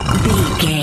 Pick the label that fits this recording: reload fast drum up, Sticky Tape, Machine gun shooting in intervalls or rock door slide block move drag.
rock door slide block move drag